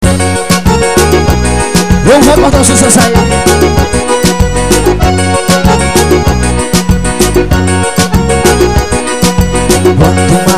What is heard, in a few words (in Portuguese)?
forro